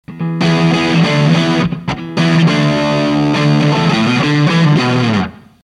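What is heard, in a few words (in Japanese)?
082, HARD, TL082CP, なんとなくコンプ感が強く均一な音に; もっと音のバラつきが / 欲しいと思いました。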